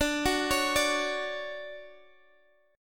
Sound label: D-Major 7th, D, Guitar, Open G